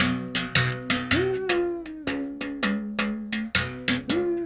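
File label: BS BERIM GRV, BERIMBAU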